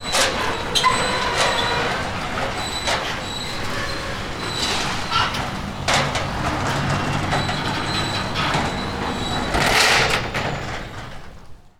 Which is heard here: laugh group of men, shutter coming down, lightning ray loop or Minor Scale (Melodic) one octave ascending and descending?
shutter coming down